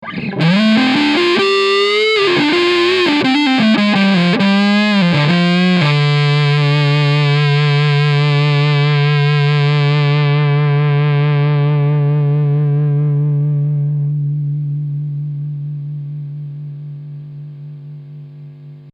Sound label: Black Magic Fuzz neck